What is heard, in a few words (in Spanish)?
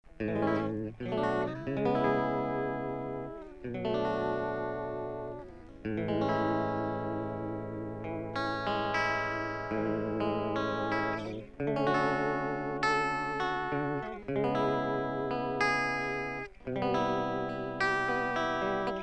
Acordes; Phase90